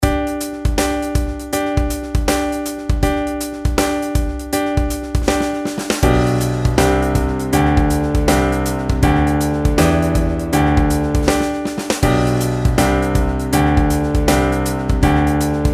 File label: pitch patterns stepwise